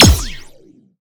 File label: Sciweap Shot Medium Laser Assault Rifle